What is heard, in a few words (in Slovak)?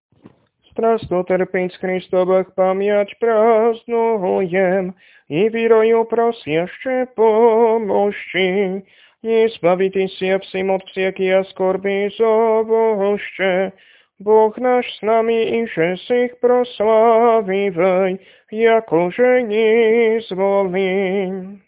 Kondák mučeníčkam hlas 4; nedelna-skola